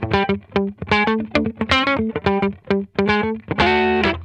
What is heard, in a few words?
FURRY, Funk Guitar; Zero G - Funk Guitar